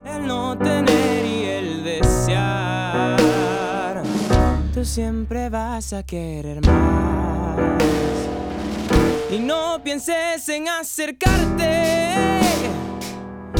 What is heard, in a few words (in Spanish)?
musica